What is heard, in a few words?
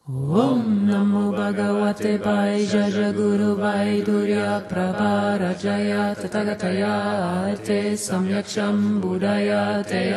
healing buddha chant